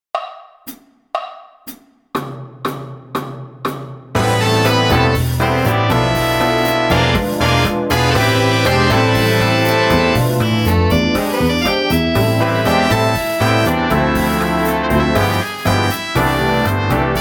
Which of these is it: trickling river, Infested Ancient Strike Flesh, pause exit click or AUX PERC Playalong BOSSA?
AUX PERC Playalong BOSSA